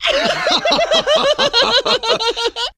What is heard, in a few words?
laughter group; ooh ahh